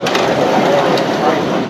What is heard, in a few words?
Door Open, Train; R110A